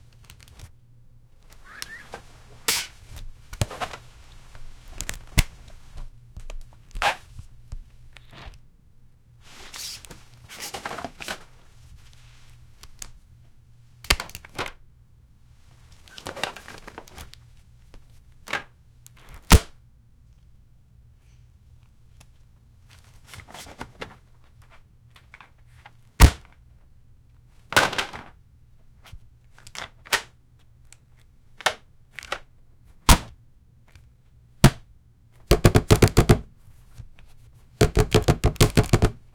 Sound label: feet shuffl, bumping; fisheye lens